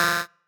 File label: marimba bike; ゆめ2っき